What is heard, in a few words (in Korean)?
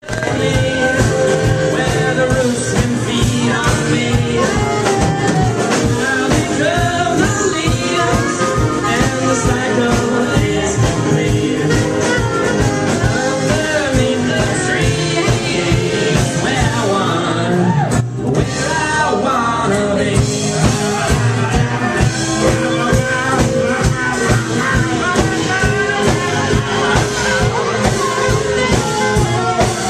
영어남 노래